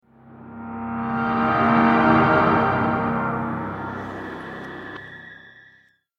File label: Stinger Decrease Action